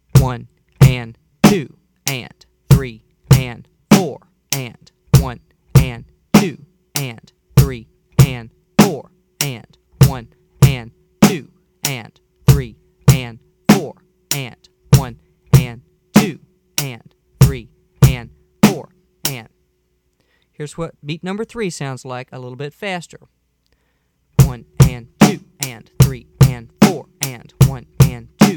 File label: Beat 2 With Snare